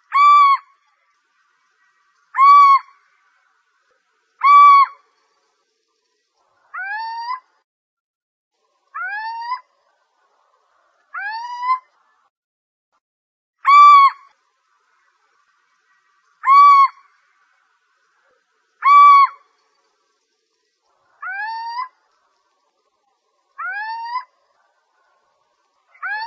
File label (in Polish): pójdźka; Przyroda ptaki i zwierzęta